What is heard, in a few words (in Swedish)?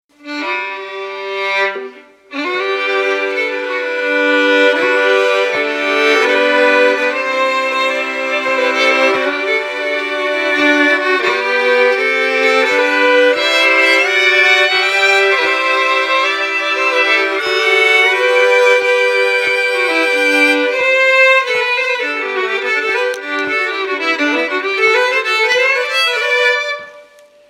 Melodi Samtliga stråkmattor